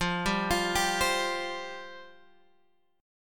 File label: F Suspended 2nd F down Guitar Open G